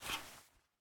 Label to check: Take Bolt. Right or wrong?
Right